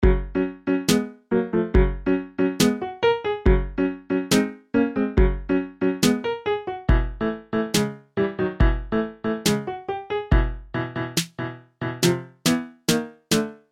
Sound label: fight theme; code game jam drop plafond 2025